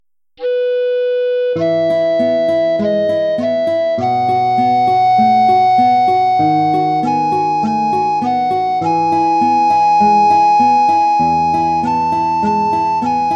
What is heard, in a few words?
etude major; chopin